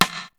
Rim Gated, Akai MPC-60

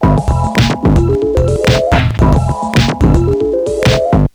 sp1200 loop

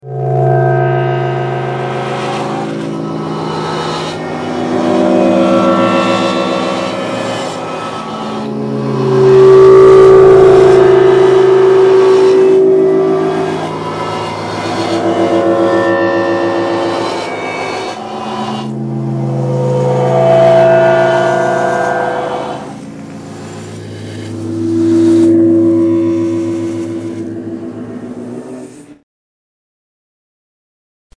chorus

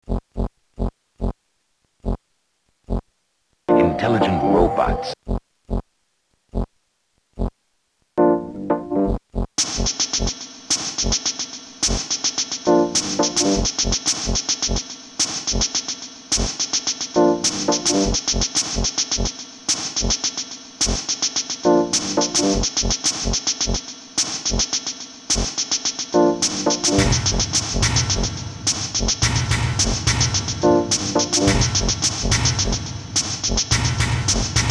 intelligent robots beat

